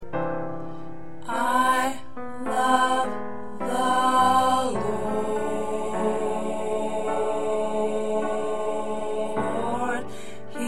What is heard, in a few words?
Alto, choir parts